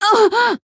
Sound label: damaged